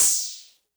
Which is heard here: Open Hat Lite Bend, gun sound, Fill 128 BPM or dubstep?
Open Hat Lite Bend